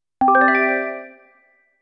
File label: Equipment Level Up; dot hack